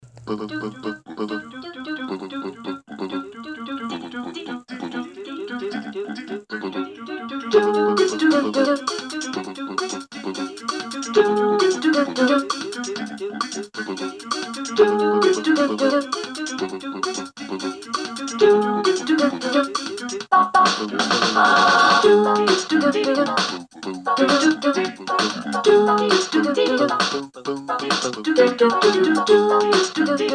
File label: casio sk 60 demosong